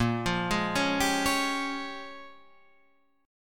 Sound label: A sharp Minor 13th A sharp, Guitar chord